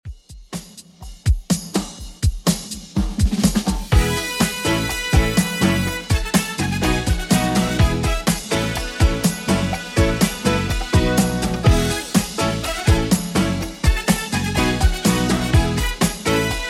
80s Redrum, 124 bpm

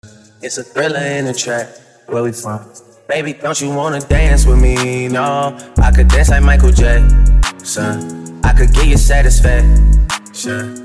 Acap Break

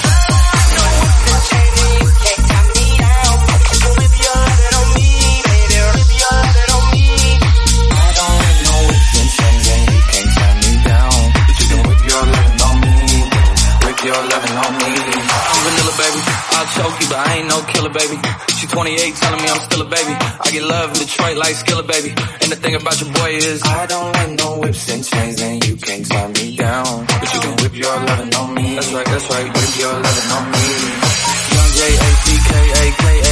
House 122bpm Bbm

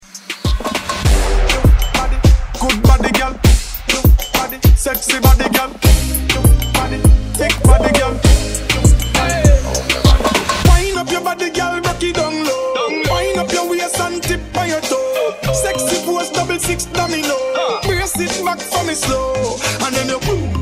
Moombahton Redrum

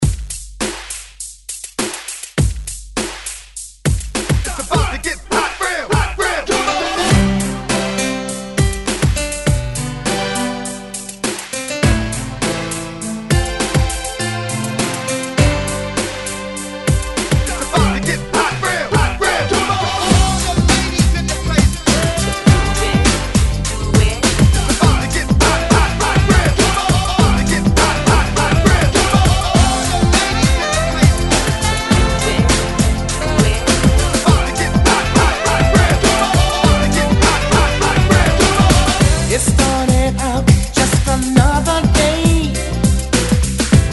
90s Hype Re Drum; DJDOLLS

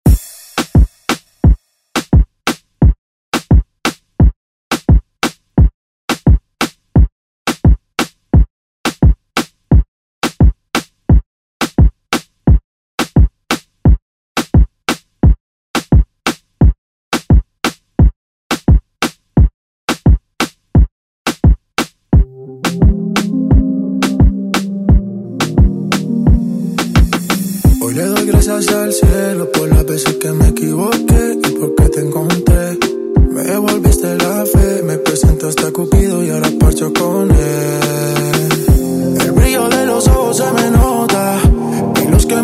Latin Urban Redrum; Deejay Hit

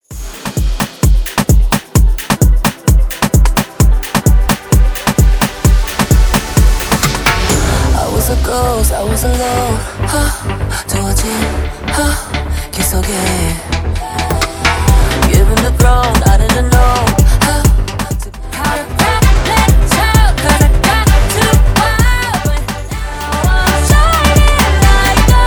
Tribal Bootleg

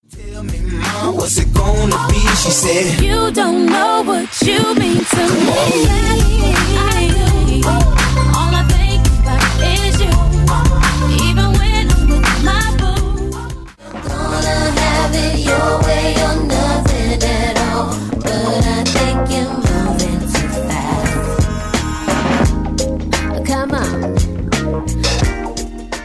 Old School Throwback RNB Megamix

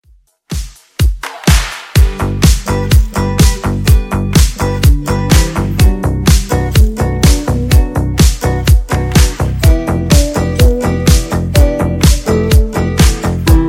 Funk 125 Bpm

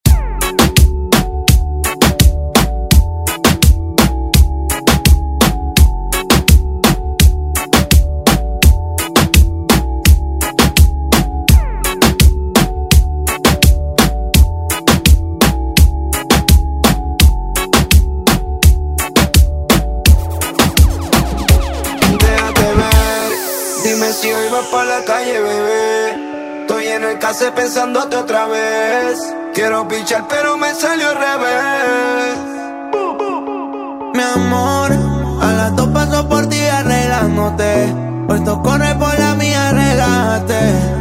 Reggaeton; Redrum

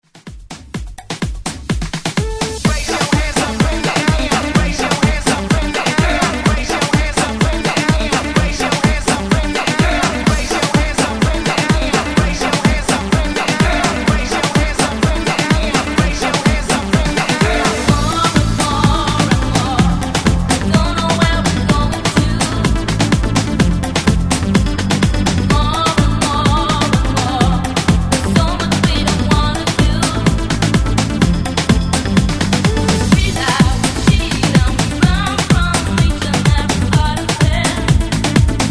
90s Dance Hype 126 Bpm

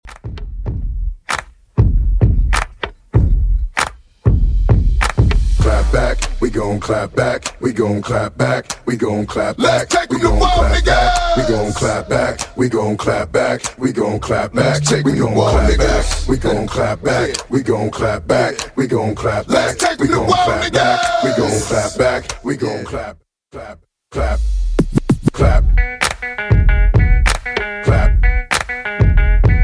Epic In 97bpm

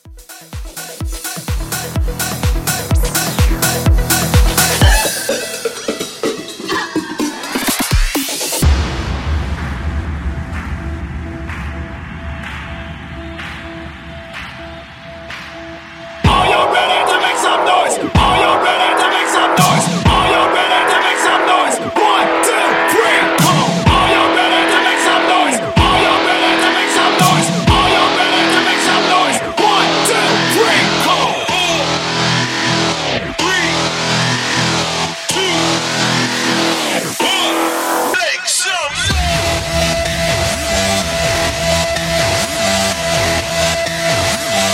Hype Bootleg 126

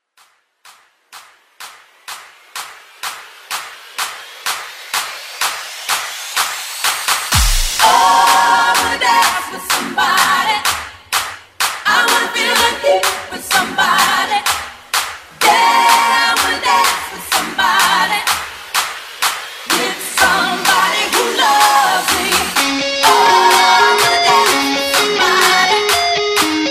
Mash Up Clap A Pella Starter

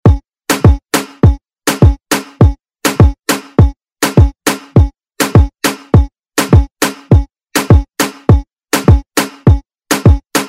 Moombah Afro Redrum

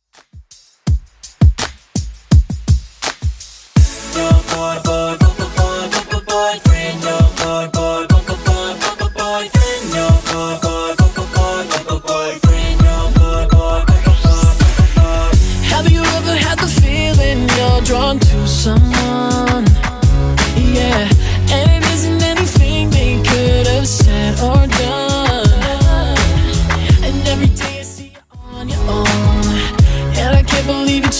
Top40 Redrum 83 bpm

